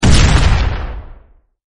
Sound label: explosion nuke; car-game